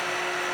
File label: grind; activities moon; Cosmic Rage